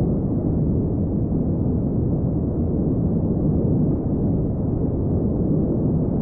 Aircrafts Plane Wind In R; DCS

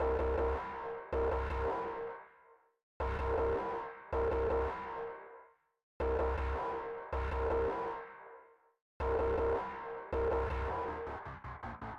music interactive; World of Tanks

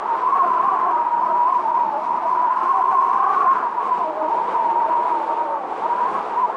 wind loop mountain; Call of Duty Modern Warfare

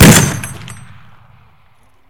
Vehicle Weapons

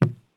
run wood; Escape from Tarkov

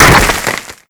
rock fall break

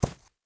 horse footstep grass hard; Rust

